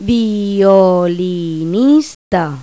VIOLINIS; Pipo-Aprende musica con Pipo

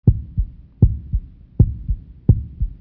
latido; efectos

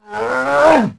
esfuerzo golpe cabeza; traidor; blade

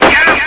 choque

